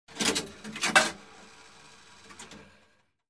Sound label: Throwing a coin into a pay phone